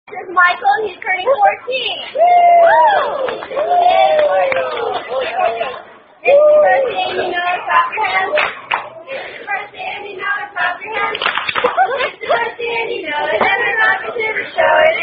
Happy Birthday Variant (Tune If Your Happy And You Know; Redd Robinnn Birthdayy